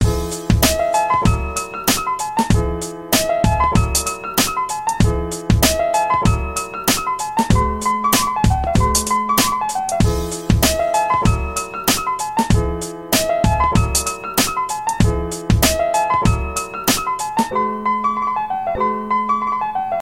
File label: Winter Beats